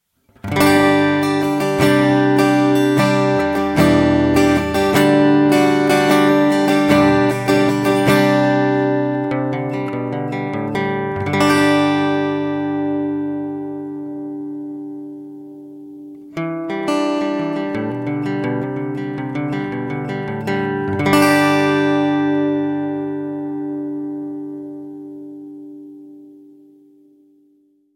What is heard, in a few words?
Taylor